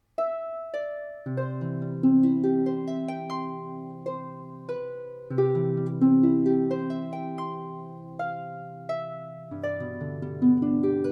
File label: Harp; Concert Etude